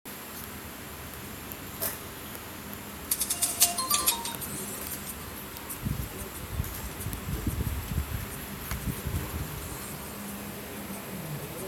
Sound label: karaoke recording